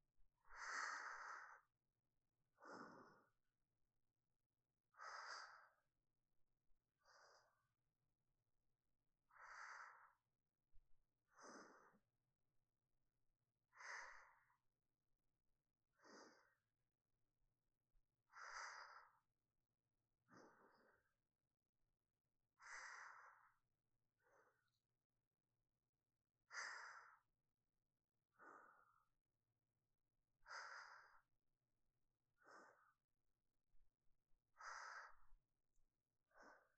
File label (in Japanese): 寝息のみ